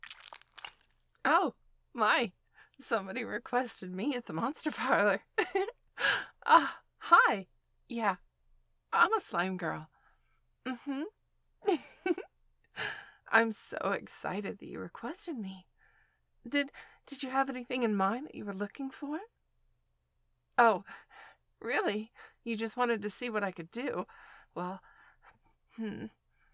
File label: Slime Girl SFX; Monster Parlor